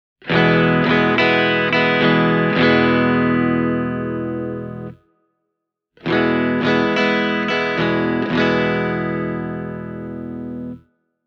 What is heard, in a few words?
viitasaari om clean bridge pu 3 way; Viitasaari Guitars OM; Olli Viitasaari; Järvenpäässä